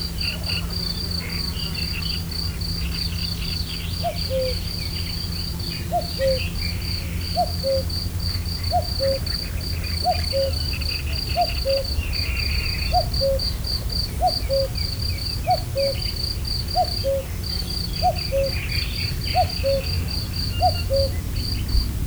kakukk mezei tucsok, kakukk; termeszetben

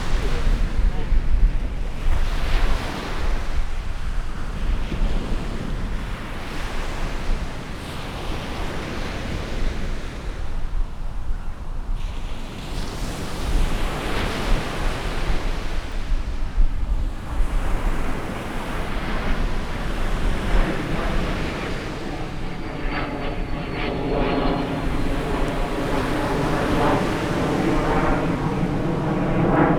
nagy víz fölé kiérő repülő

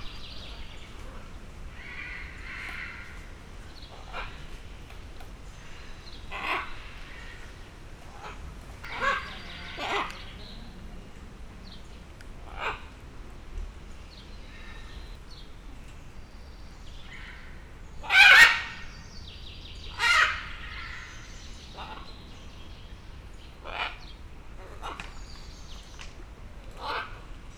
halkabb időszakuk, zöldszárnyú ara; szegedi zoo 2011

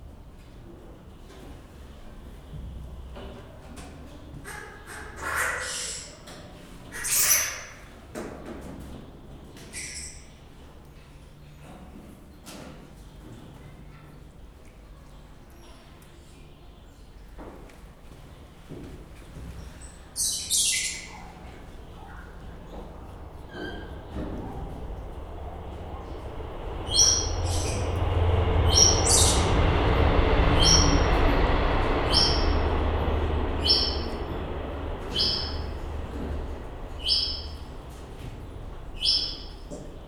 a mikrofon mögött halad el a vonat, gyűrűsfarkú maki; kecskemeti zoo 2016

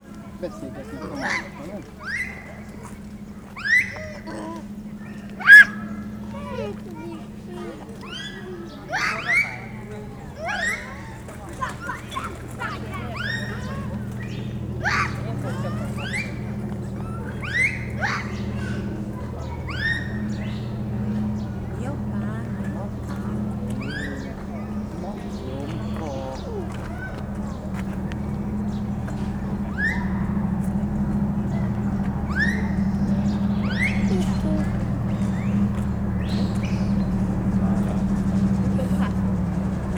majmokat utánzó gyermekek, japánmakákó; szegedi zoo 2011

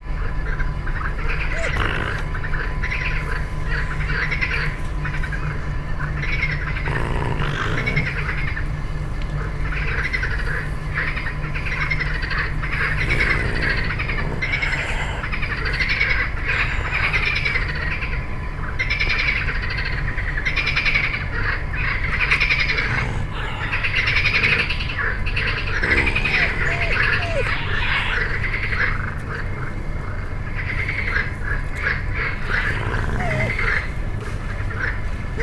aranysakál is, ipari üzem, kecskebéka; szegedi zoo 2011

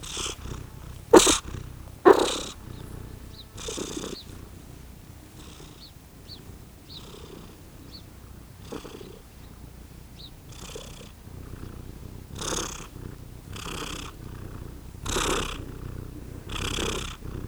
dorombol, macska; állathangok; paraszt udvar

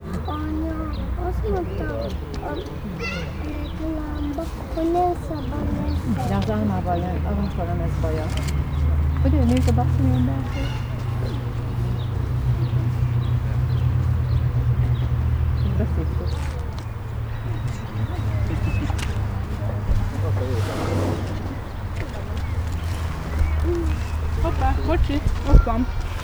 szimatol, azsiai elefant; állathangok; nyíregyházi zoo 2010

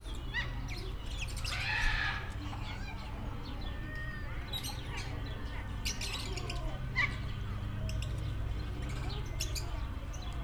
vari; állathangok; nyíregyházi zoo 2010

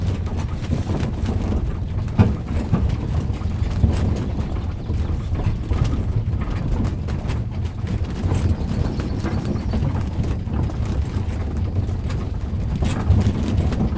szekerezes, ulesek magasaban a mikrofon, lovaskocsi, hortobagyi puszta

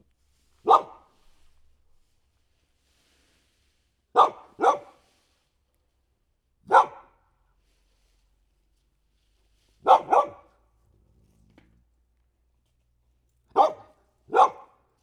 ugato kutya; varboc

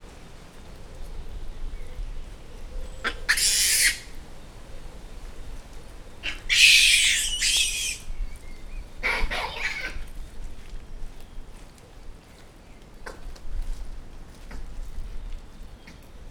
szabadban evés közben, galléros pávián; pecsi zoo 2017

